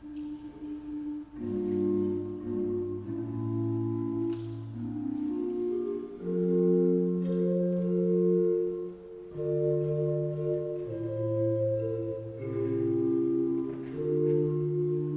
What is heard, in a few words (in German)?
Orgel; orgel lieder